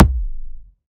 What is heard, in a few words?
shiny kick sample b key